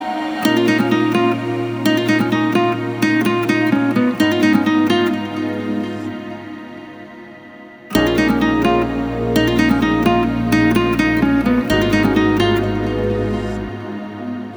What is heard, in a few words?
Ringtone